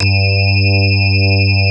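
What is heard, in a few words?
JV electric piano; Pro-Rec - Synthsonic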